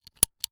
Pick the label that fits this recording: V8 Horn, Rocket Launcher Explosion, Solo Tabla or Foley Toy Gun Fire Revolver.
Foley Toy Gun Fire Revolver